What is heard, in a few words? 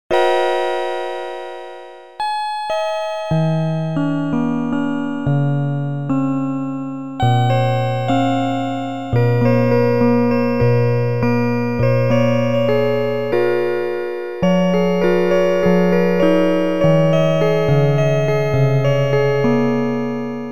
Fairytale